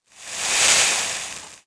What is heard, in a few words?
sand shot; World of Tanks Blitz